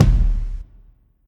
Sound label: thumpy kick drum sample F key; kick drum samples